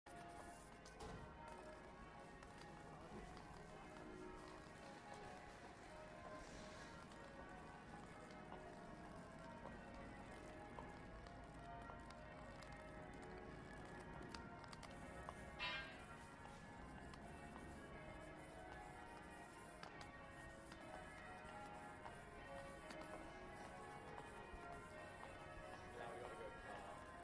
bells before advent service as i walk towards cathedral